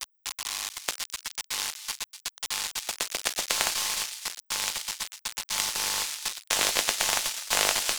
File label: Envelope Beat; Drums & Drones